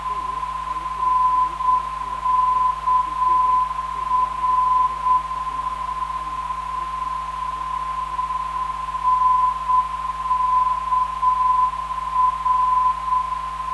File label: NKR 292kHz